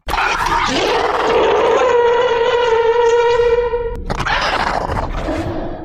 vampire monster scream

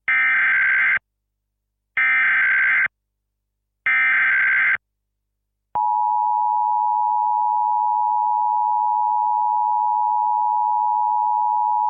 emergency alert system button

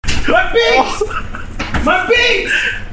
my beats

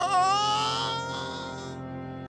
adult dying; elden ring

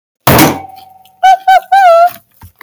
ah ah ahhhhhh

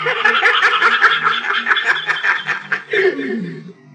creepy laugh

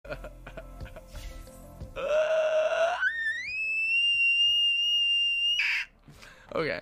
pterodactyl

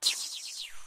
laser

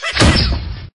toy bomber shoot

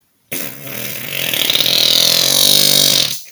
long wet smelly ass fart

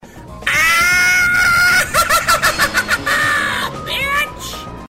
cackle

